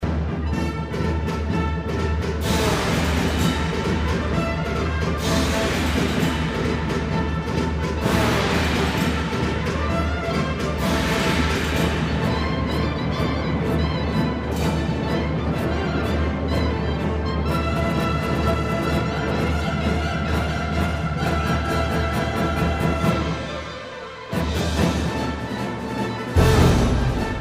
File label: Symphonic band